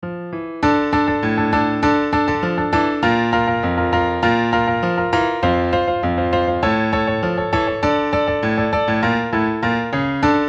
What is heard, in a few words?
Piano